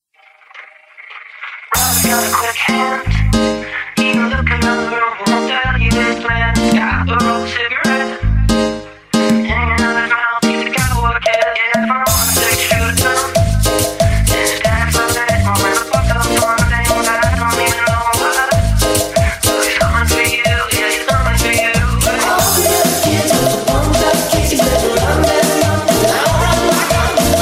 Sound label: cumbia ringtone